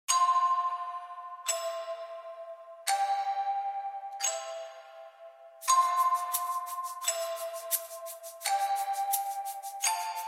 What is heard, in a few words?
nodrums